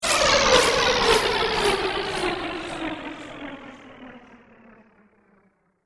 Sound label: Alien Starcraft Slows Down; Alien Sound Effects